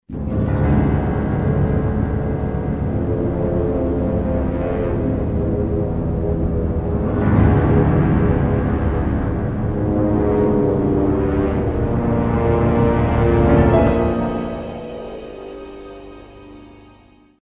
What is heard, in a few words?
stinger song, music stingers; hl2